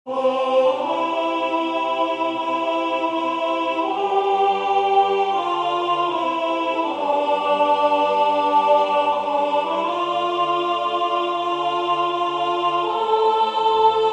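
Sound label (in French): alto, Musique sacrée; Benedictus qui venit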